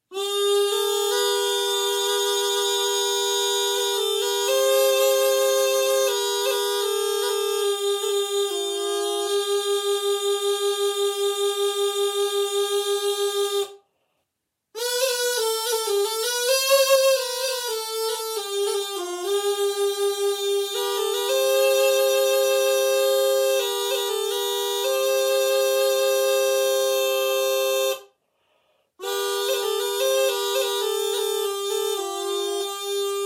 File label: reed pipe